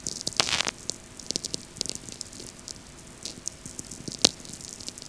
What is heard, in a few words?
VLF; StMichel